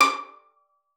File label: D6, VIOLIN PIZZ; Xsample VOL-5